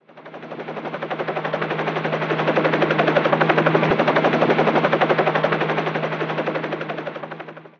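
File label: helicopter; F1RACING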